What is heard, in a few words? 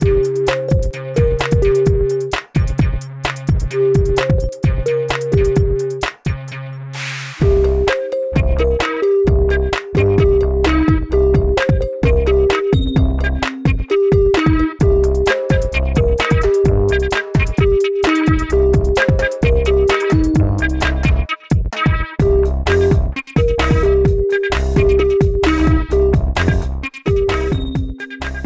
loop